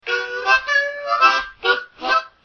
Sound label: blues harmonica lofi, harmonica riffs